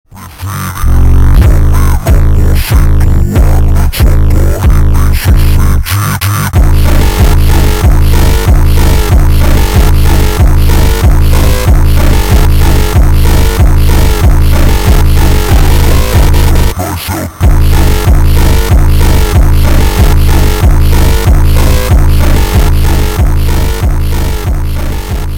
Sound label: slowed